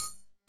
dagger stab; Character Actions

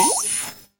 shield up; Status Effects; freepixel

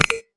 card play; Cards & Board

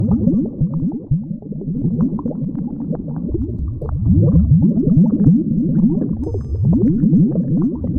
lava bubbles; Ambient; ambient loops

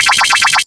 teleport arrive; Movement

